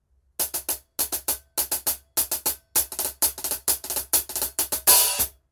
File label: 13in Pocket Hats, cymbal